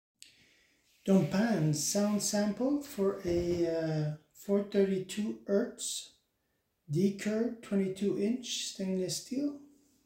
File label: 432hz dkird 22 inches 10 notes; elite series